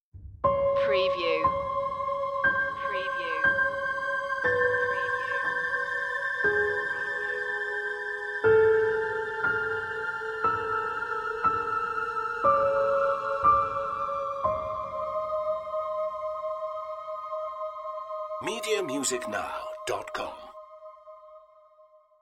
sinister halloween twinkle twinkle

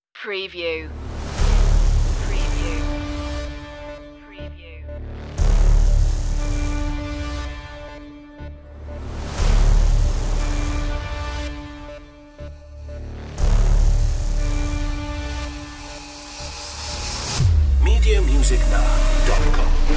hybrid trailer no drums or hits

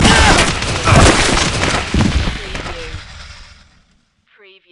Skiing, Snowboarding, Fall with thump, vocal argh

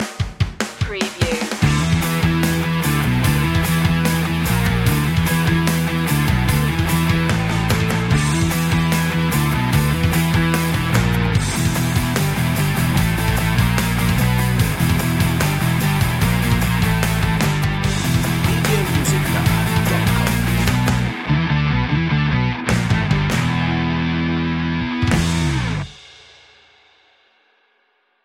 nitro boost rock

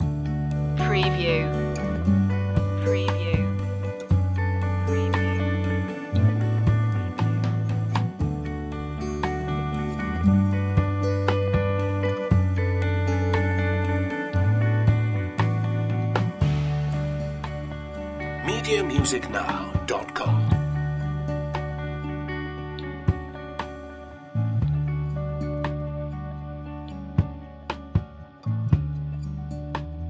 simple thanks loop

